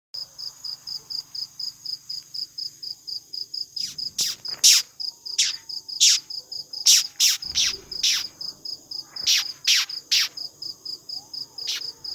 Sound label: Polyphylla olivieri; کرم سفید ریشه